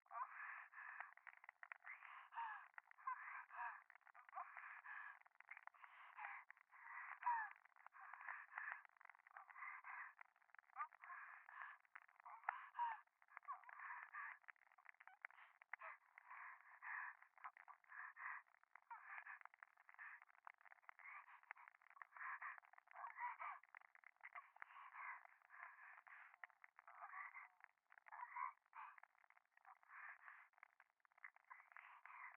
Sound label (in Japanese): 右耳 密着 処女 喘ぎ 暖炉